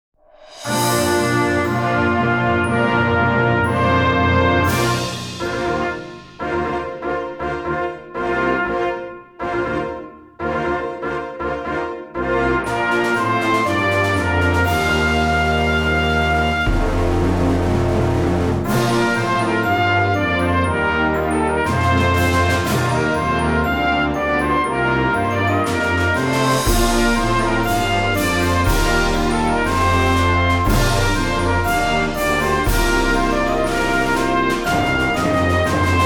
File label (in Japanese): ファンファーレ; サンライズ・ファンファーレ